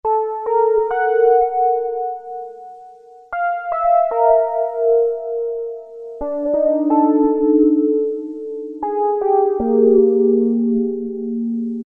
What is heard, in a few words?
Chorus Bell, Roland Juno-106; Factory Preset